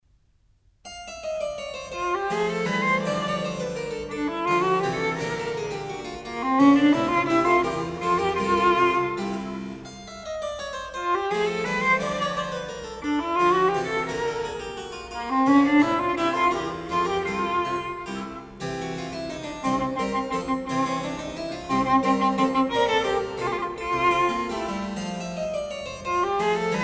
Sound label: Sonata in F KV13 - Menuetto I & II, Violin sonatas; Mozart